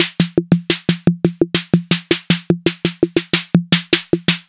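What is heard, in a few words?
TR-808 snare drum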